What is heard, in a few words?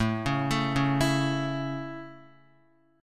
A Major, Guitar; arpeggiated